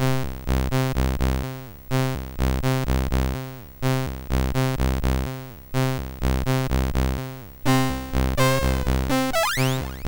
sid, MOS6581R4; Crazy Blaster

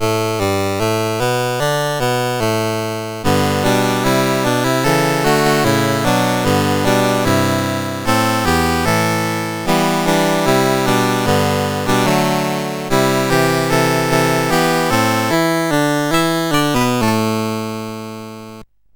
sid CSG8580R5; Games Summer Edition menus

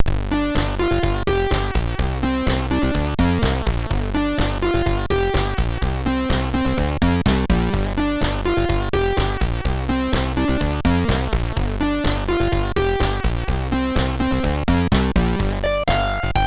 Lights On, MOS6581R3

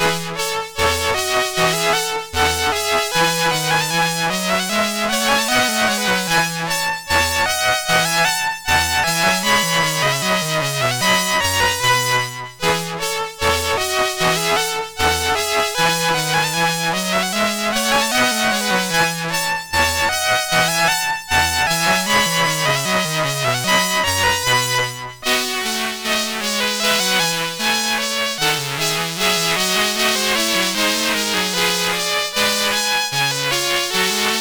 Gavotte, MOS6581R4

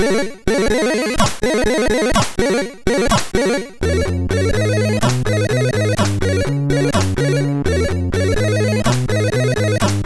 New Age, MOS6581R4

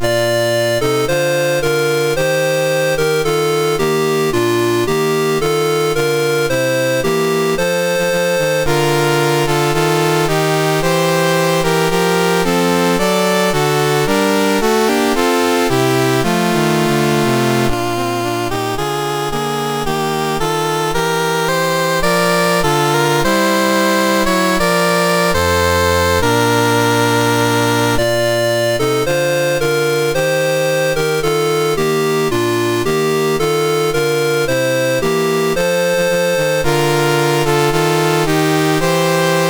Santa Lucia, National Anthems, MOS 6581; MOS6581R3

